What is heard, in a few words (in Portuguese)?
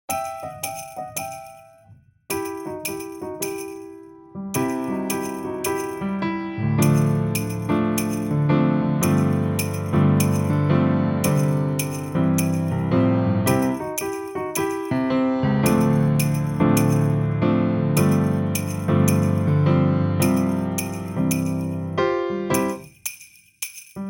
Sinos De Sábado (Backing), playbacks musicas criancas; primeiros passos